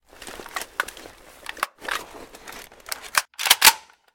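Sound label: long unhurried assembly of a machine gun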